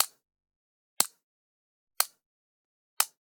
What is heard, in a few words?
Generic Button Plastic Tiny